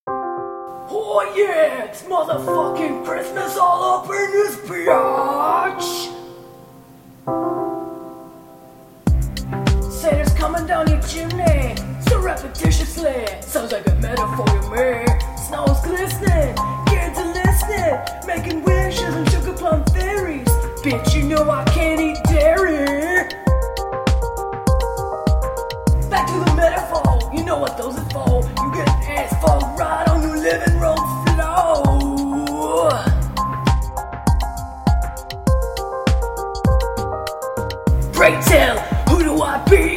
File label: Awful Christmas Rap